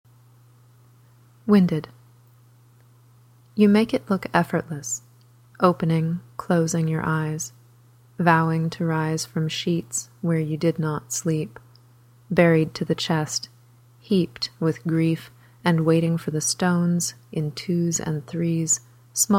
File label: winded